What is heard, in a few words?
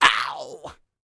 A YELL, MALE HUMAN; ILIO; Vocal Planet